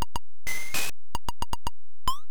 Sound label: atari key click; Elevator Action